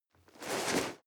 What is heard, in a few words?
nylon leather medium short